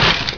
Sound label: wood, debris; XDM